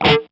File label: bad note; Clone Hero